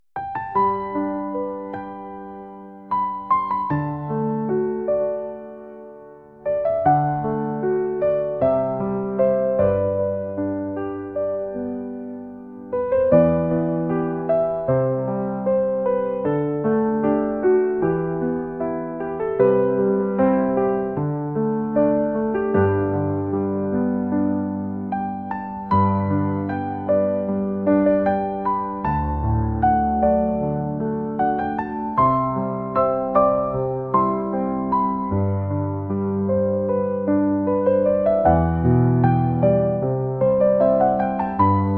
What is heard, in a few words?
tender chime; krasivaya muzyika